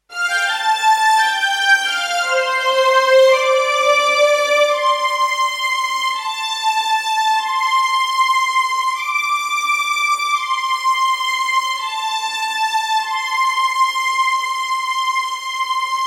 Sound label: Strings Wet